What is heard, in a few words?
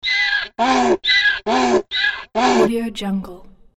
donkey braying; soundhills